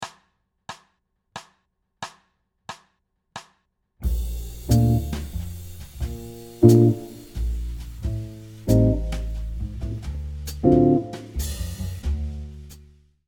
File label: Chords